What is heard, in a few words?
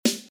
drum snare